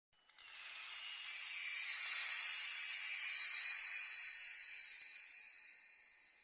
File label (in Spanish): sonido resplandor; sonidos magicos